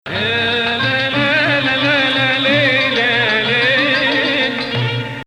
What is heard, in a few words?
Sikah Jadaka Rast